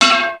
BLAST; East West; Global Sounds

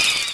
Fan Chant; Mario Paint

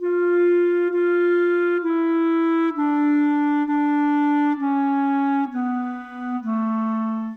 Clarinets, Orchestral; Sonic Foundry (Sony Creative Software) - Orchestral VOL-2; Modern 38

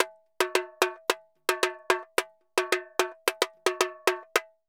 Tamborin Murga 110 bpm; Best Service; Festa Latina VOL-1

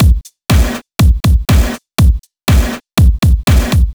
121 Drums; 121bpm; Nu Dance Electro; Zero-G; Soundclash; Acidized Wavs; Combi Loops; Slap n Tickle

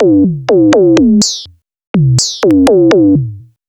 ARP2600; AMG - Vince Clarke Lucky Bastard

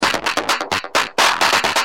Dist, Industrial, Drums Perc

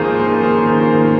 Piano Blur

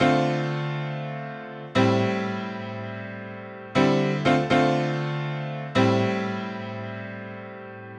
Chord Piano Am, Nu Disco, 120bpm; Zero-G; Extra Chord Progs

